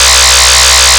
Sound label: lead; Stereo